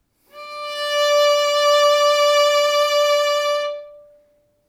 Viola D 5 Tenuto Vibrato

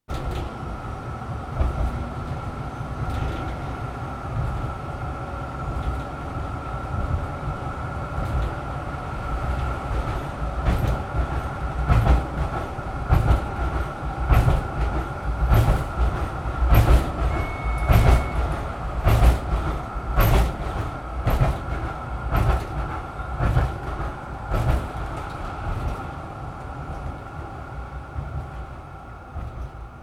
Travelling On A Tram

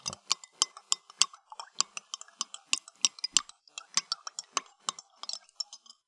Cup Coffe

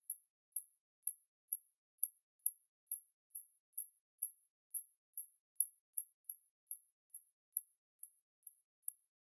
Australian Bat South Australia

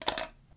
Dropping A Pen On Wood

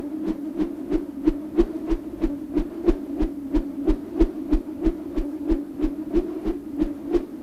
Copter Slow

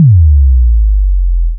Bass drop short

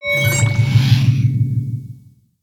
Plasma Charging

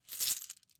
Coin Collect

